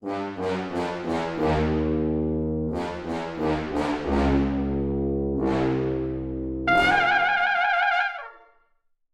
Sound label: mystic cave game over